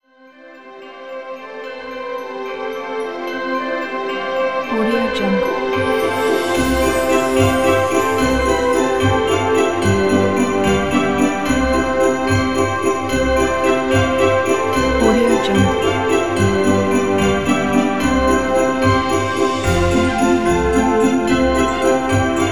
christmas new year; metromusic

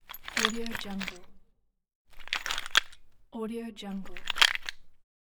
short movement checking small box items

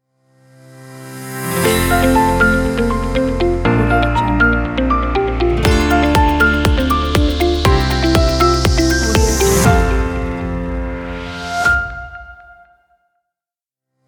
corporate logo

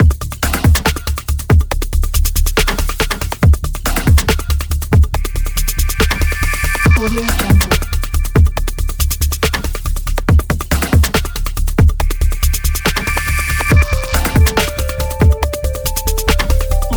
high tech background

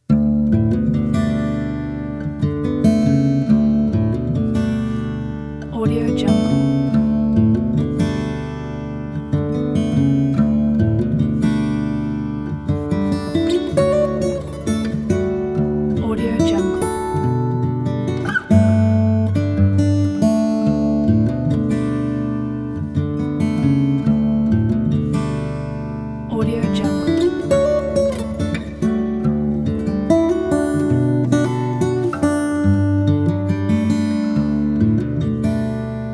peaceful acoustic guitar

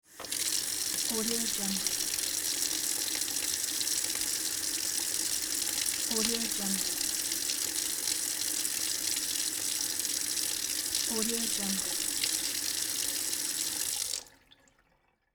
tap water

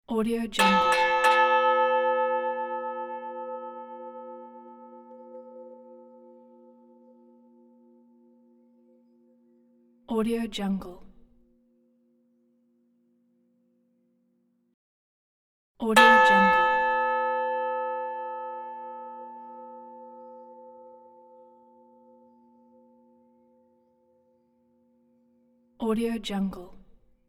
church bell bulgaria; spillaudio

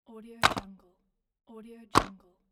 chalk sticks in plastic container

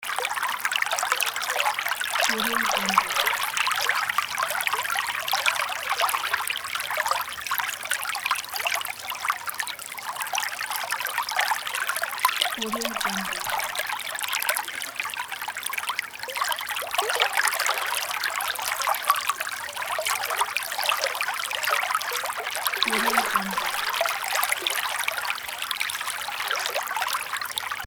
river loop

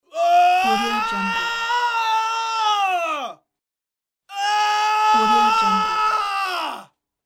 man screaming

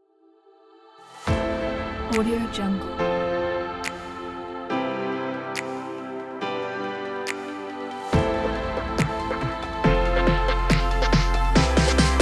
future bass

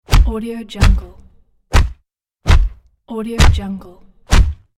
punches hits

